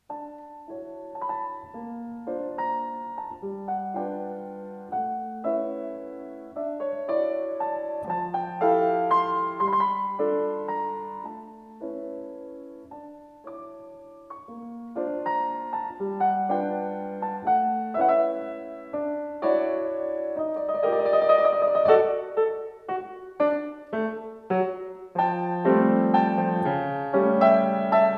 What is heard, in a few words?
waltz